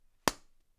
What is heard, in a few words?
Slap